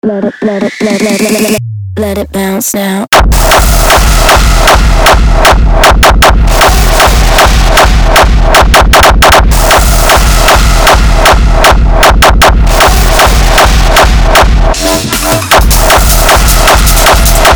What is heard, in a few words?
Hard Techno